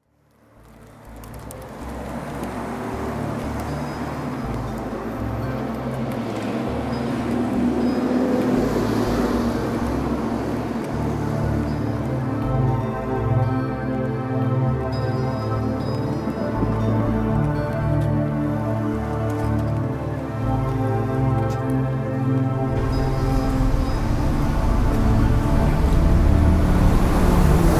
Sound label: Tombland Soundscape